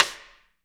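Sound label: SD, snare drum, Real-Kit; 90 s Sample CDs; Sound & Vision - Gigapack I CD 1 (Roland)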